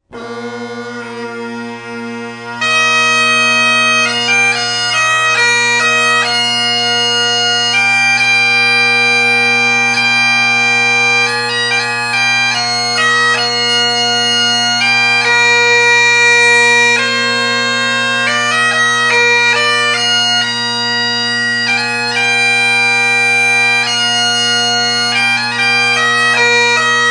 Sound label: Bagpipes